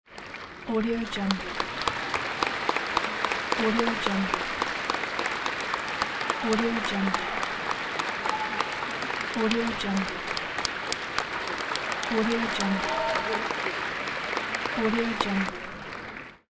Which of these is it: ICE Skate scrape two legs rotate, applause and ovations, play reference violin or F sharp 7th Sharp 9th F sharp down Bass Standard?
applause and ovations